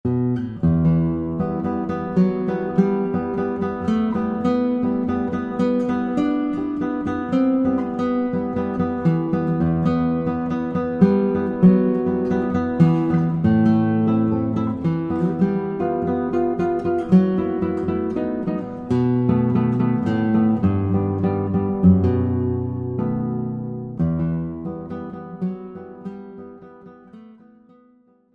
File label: Prelude No. 1 Lyric Melody